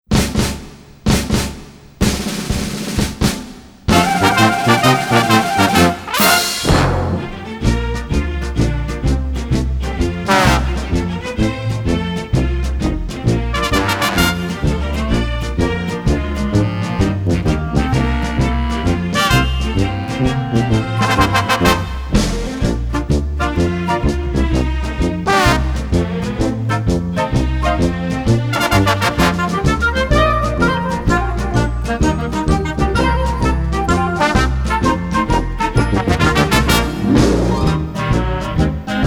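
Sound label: Accompaniment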